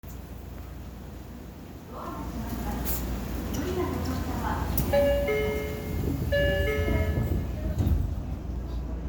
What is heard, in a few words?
Chime